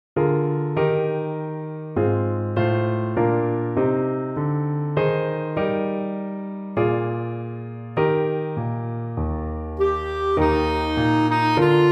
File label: Alma Mater PIANO MELODY